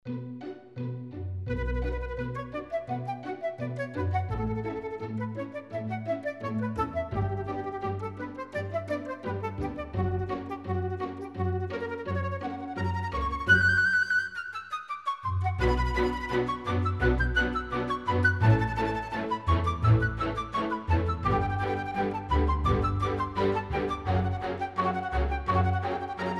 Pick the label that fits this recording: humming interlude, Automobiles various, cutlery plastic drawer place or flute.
flute